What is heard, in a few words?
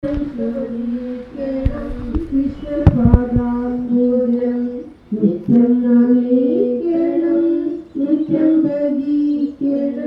hindu chants, South India street recordings